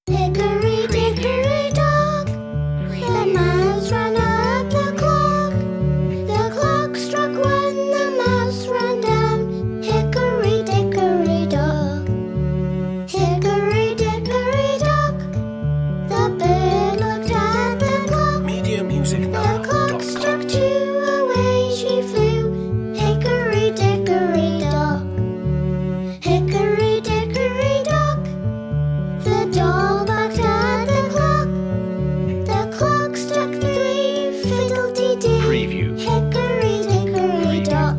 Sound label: hickory dickory dock children vocals